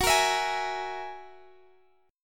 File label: Gb 9th Gb down Guitar Drop D